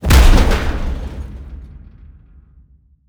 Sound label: metal; CosmicRage; combat; bigtail